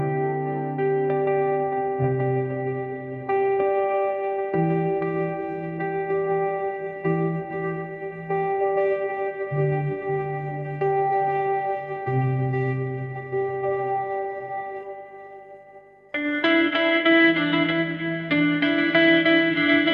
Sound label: ringtone pop